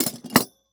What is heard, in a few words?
Household Metal Meat Tenderizer Set Down On Wood